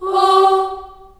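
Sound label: G3 Choir Children Ohs, Choir Acoustic & Synth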